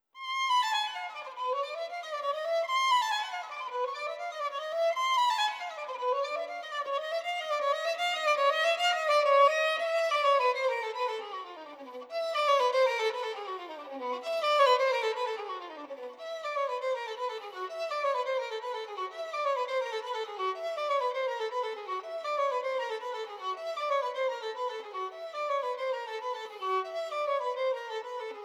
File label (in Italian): Violino